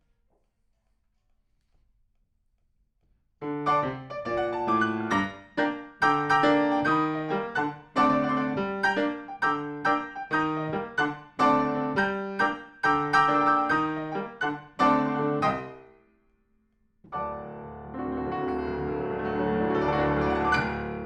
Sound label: PIANO